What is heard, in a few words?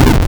thud